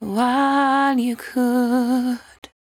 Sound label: 74-5bpm B, Velvet Vocal; While U Could; Zero-G